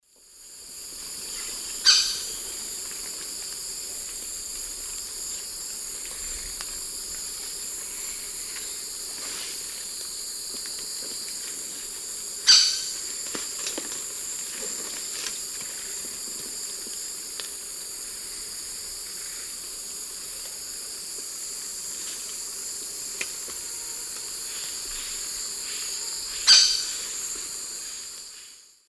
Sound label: Phoenicircus nigricollis, calls; S. America; Eastern Lowlands